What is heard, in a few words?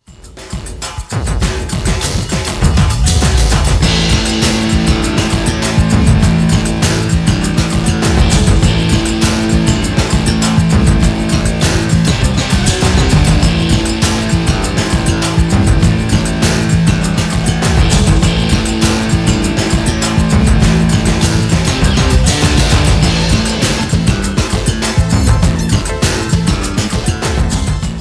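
background music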